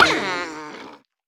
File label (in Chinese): 犰狳死亡声